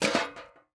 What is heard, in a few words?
ceiling light deploy; Rust